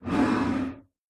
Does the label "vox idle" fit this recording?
Yes